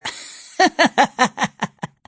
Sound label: laugh taunt; Adachi